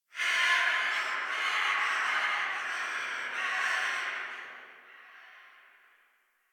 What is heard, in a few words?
Tree Distant Critters Scared, Ambience; Warframe; Duviri